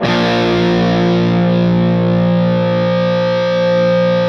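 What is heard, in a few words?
fuzz chord, fuzz guitar vibrato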